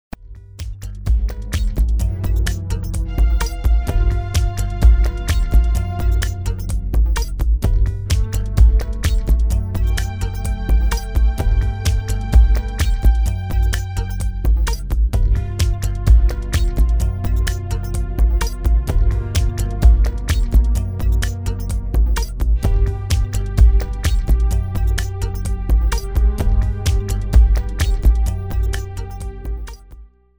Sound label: Scary